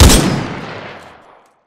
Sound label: shoot; EMXArms; mg90